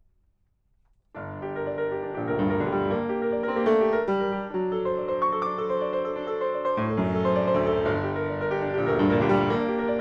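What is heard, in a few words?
domra, Neumann TLM170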